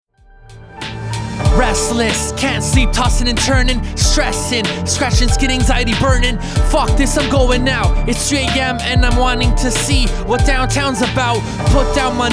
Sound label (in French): mix rap delay sans effet